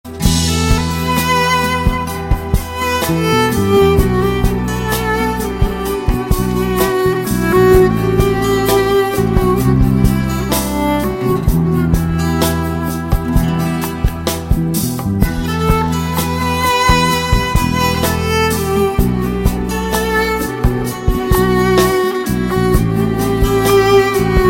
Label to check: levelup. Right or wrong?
Wrong